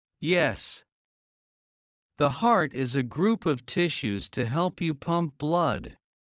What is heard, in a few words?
Heart low; Classroom humanbody